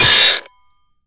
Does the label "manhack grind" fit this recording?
Yes